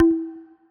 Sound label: lock